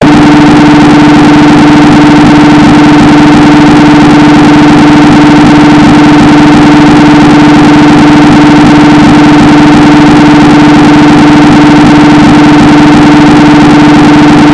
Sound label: 240hz earrape